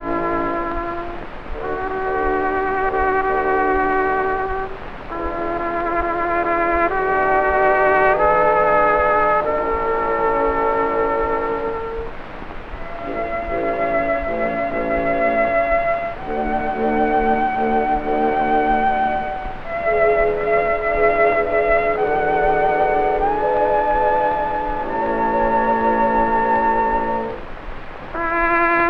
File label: trumpet solo with orchestra, saxophone